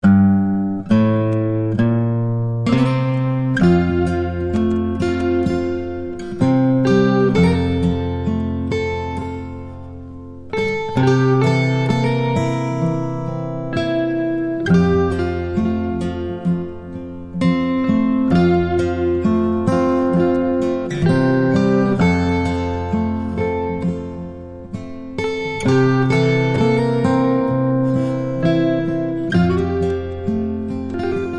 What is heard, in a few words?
Oh Tannenbaum, without Jingle Bells